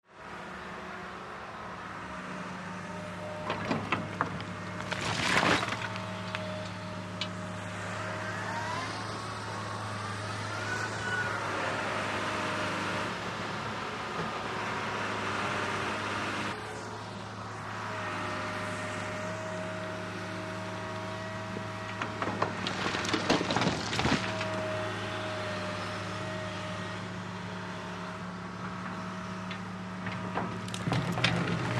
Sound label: VEHICLE - CONSTRUCTION JOHN DEERE 750: EXT: Idling, dumping debris into pit.; 750 Dozer